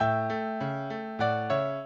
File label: minuet, mozart